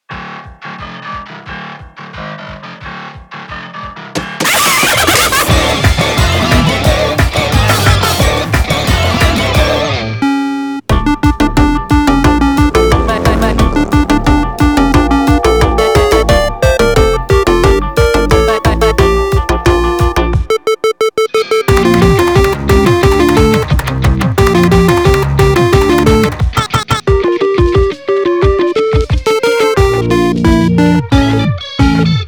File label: Synth Mix